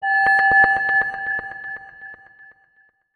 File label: Echo, notifications